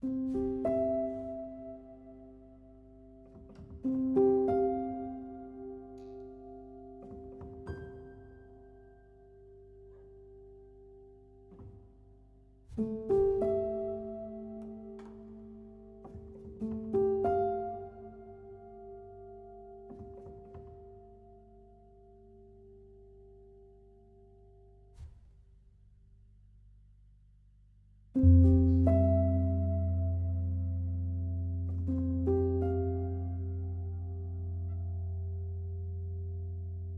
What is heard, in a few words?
river bgm head 94bpm CMaj 92beats; Chicory: A Colorful Tale; macOS PS4 PS5 Switch Windows